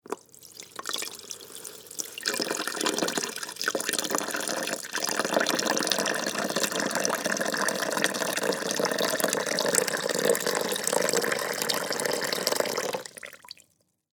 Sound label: Coffee Maker Pour, Household, Coffee, Maker, Pour, Water, In, Slow; Appliances